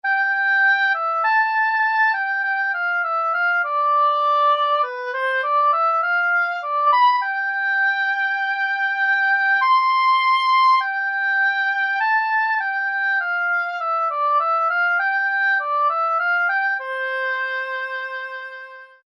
Oboe

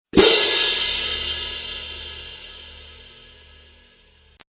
Platillos Orquestales; honduras musical 7mo grado

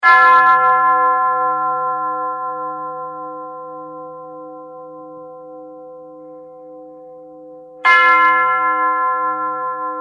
Campanas Tubulares; honduras musical 7mo grado

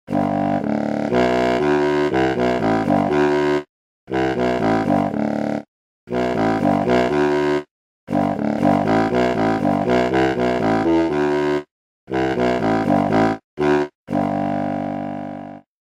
Contrafagot

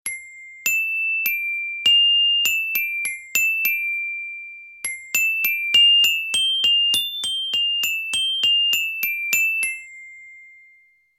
Glockenspiel; honduras musical 7mo grado

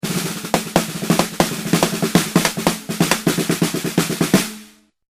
Redoblante; honduras musical 7mo grado

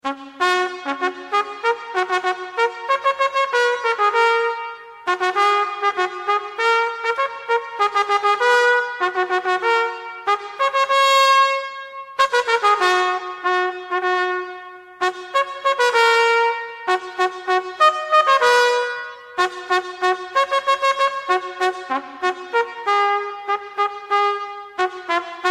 Trompeta

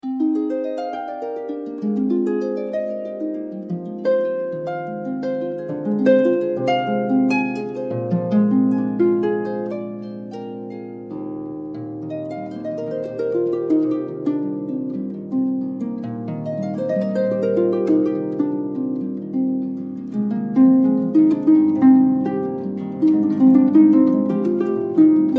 Arpa; honduras musical 7mo grado

